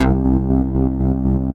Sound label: Analog Bass; Ableton; Retro Synths; Analog Bass Vol 2